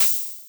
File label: Beat Cym, Yamaha FS1R